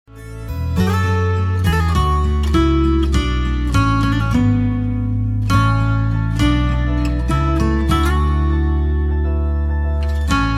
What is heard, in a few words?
melody bgm; tamil; kaatru veliyidai